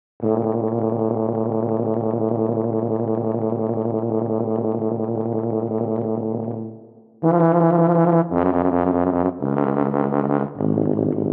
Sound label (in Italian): TUBA trylky